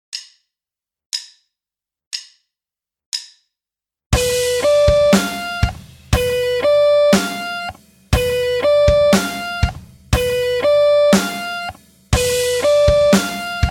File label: sweep